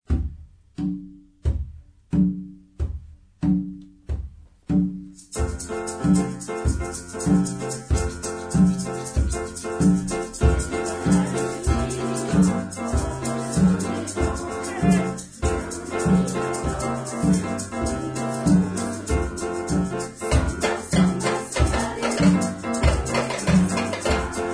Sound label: samba lele